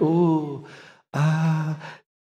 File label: "ooh ahh" male